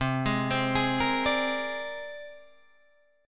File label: C 7th Suspended 2nd Sharp 5th C Guitar Drop D